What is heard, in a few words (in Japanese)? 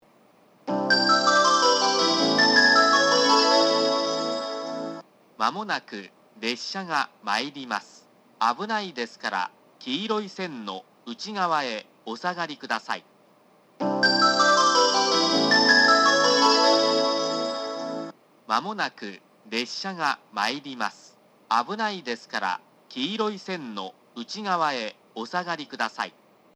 由布院, 上り列車接近メロディ＋放送（男声）; 点字ブロック化前; 1 日田・博多方面 / 大分方面